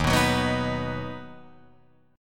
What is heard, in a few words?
Eb Minor Sharp 5th Guitar Open F; Ebm#5 strummed; JGuitar